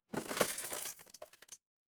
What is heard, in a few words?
impact crash